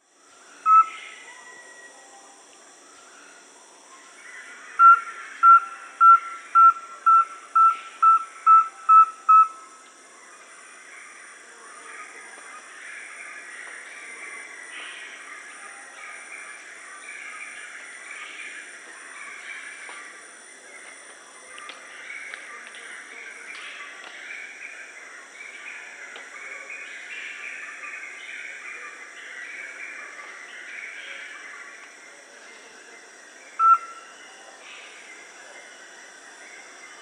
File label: Rusty-belted Tapaculo (Liosceles thoracicus), Peru; S. America